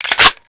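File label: weapon foley mini uzi reload clip in; Call of Duty Modern Warfare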